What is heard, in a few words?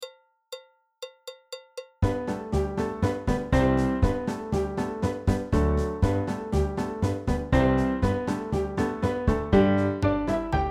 Backing Track; cotton eyed joe folk song